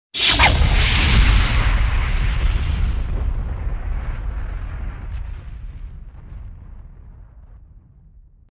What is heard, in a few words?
Fire - Synthesized whip, with explosion tail; Hollywood Edge Sound Designers Tool Kit